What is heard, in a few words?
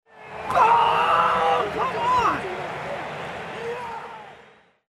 hammer throw